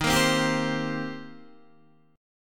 D sharp 6th, D sharp, down, Guitar, Open D

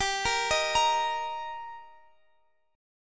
G Minor Sharp 5th G down; arpeggiated; Gm#5

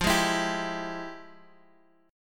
F Minor Major 9th, guitar chord

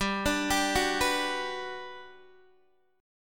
G Major 7th, G, down, Guitar, DADGAD

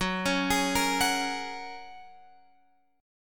F sharp Major Add 9th, Guitar, Open F; F#add9 arpeggiated; x,13,13,15,13,13; chord shape

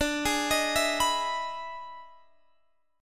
E Major 7th D, E/D Major 7th chord

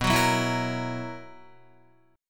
B Major 7th Suspended 4th, Guitar; BM7sus4; strummed

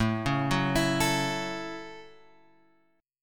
A 6th, guitar, strummed; A6 Chord {5 4 4 X 5 5} arpeggiated

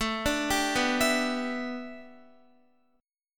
A 7th Suspended 2nd Suspended 4th Guitar

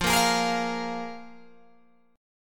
F Minor Double Flat 5th, Guitar, Open G

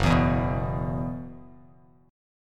F sharp 7th Suspended 4th, Bass Standard; F#7sus4 strummed

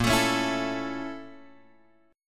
A sharp Minor Major 7th Flat 5th A sharp; A#mM7b5 Chord {6 X 7 6 5 5} strummed

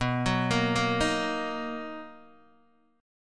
B Major 7th B down Guitar Open D

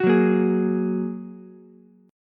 F sharp Major Add 9th, Ukulele; strummed; 11,6,6,11

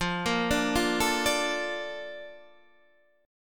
Bb Major 7th, F

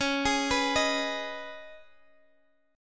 Db 7th Suspended 2nd Db Guitar Half Step Down

